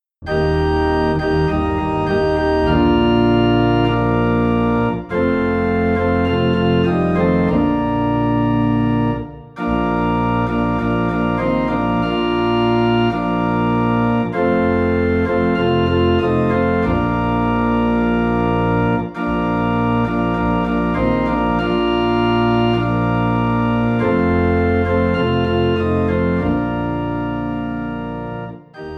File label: Organ Low in B Flat; All for Jesus